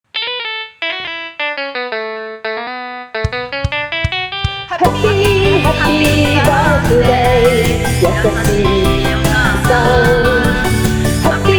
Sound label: birthday song